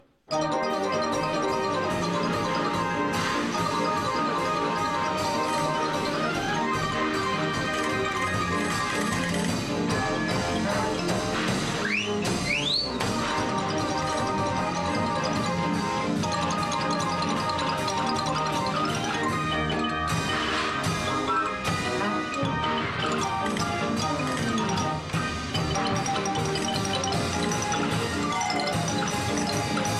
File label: Cartoon Instrument